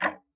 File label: Tick, Clocks Kitchen Clock